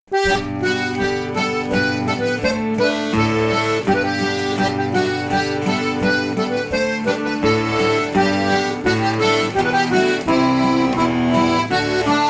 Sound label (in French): Branle de Bourgogne